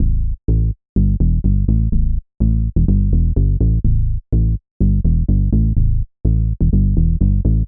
alchemy no distortion

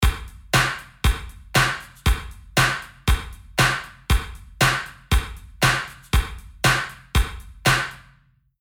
drums trash; fabfilter

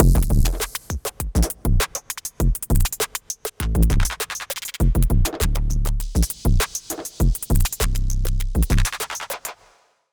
Drum Squasher

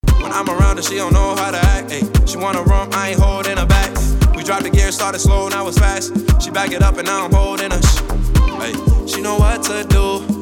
hiphop effect parallel

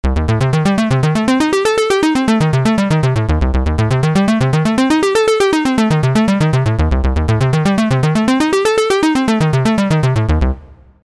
Synth no Vel control; MIDI velocity

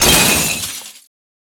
Break Mixed; Tsugi; DSP Action Examples

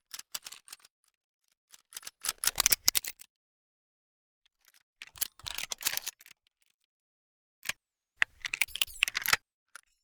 Foley Gun Handling

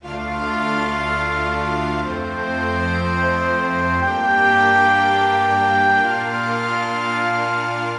midi pro strings smooth voicings high winds

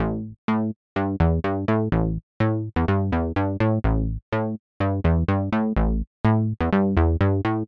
Retro Synth no Sub

